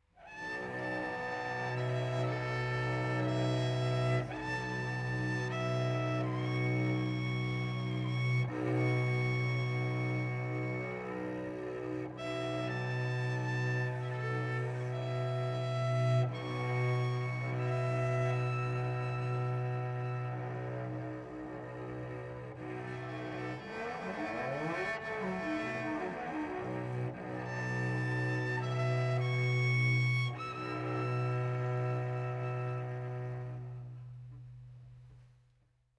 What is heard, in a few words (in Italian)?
Violoncello